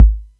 Atlantex Music Percussion Computer Kick, drum machine